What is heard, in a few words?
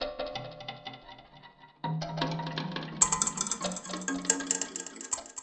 Mbira Underground, Acoustic Beats; Sonic Foundry (Sony Creative Software); Myths of Technology